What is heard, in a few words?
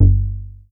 G2, BASS; Q Up Arts; Dream Experience; BASS BANK 6